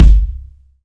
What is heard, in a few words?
puffy bass drum g key, Royality free kick drum sound tuned to the G note. Loudest frequency: 159Hz